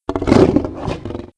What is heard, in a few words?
Lion Attack; Playable Tutorial Level